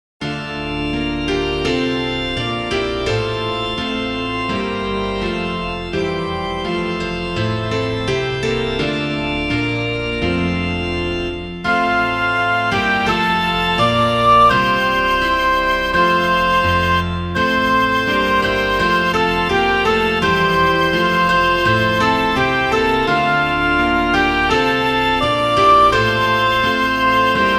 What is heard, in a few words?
Love Came Down at Christmas, Hymns